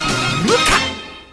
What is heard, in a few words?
crest